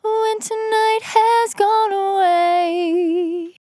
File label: On A Star 65bpm F, Vocal; Zero-G